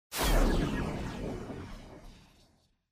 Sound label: magic fly; The Witcher Hunt